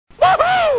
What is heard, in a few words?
"woohoo"